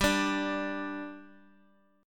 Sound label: G 5th G, chord; G5 strummed